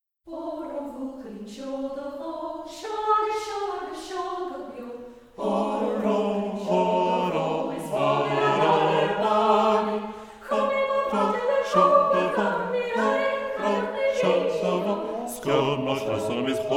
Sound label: Bass; Oro Bhuachaillin seol do Bho